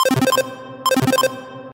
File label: Crunchy Beeps